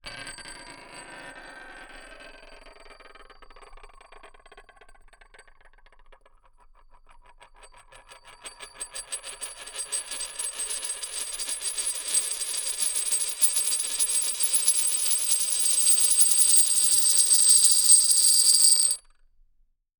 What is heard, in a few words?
spinning, crescendo, stone surface, coin, xlarge, dense, classic, bright, ringing; Eiravaein Works; Eulerian